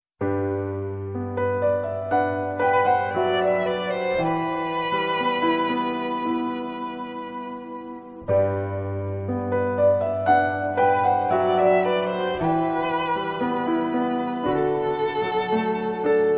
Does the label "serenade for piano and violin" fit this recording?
Yes